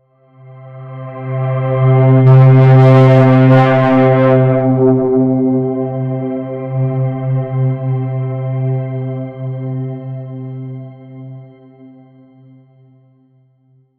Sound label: dark alone very cinematic